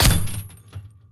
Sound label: shotgun